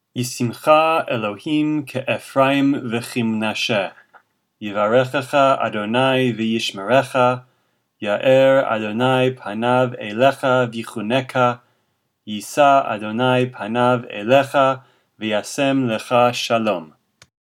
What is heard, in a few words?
Blessing for Children - boys; friday night at home